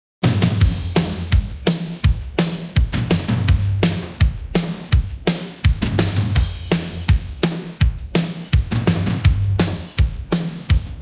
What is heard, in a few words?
pattern, drums